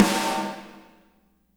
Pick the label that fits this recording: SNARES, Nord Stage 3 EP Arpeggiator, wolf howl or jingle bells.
SNARES